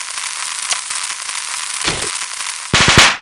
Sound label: firecracker toss; venus